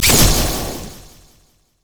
critattack; LoL; yasuo skin01

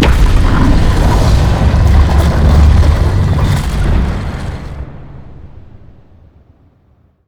viking shop open; LoL; emitters map12

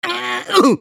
dying; Heimerdinger

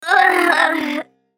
dying; BadRobotAmumu

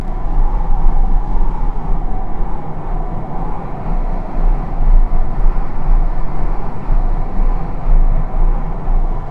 q loop; LoL; twitch

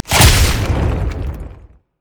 spider attack; LoL

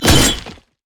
darius basic attack

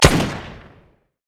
pirate basic attack; LoL; gangplank

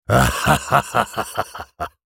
laugh; SpiritUdyr; en US

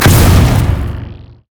wildfire; LoL; brand zombie

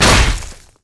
basic attacks; Vi

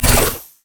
decimate; LoL; darius zaunknight

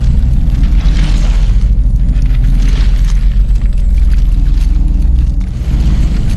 gem knight shatter loop; LoL; taric

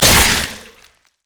heat seeking missile; LoL; urgot

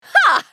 ultimate effort; Fiora

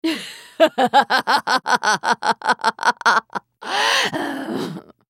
laugh; Cassiopeia; Character Voices - English

